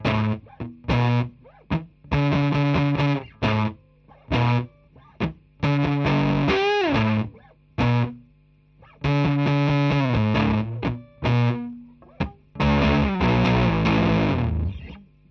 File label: PolyPolyElec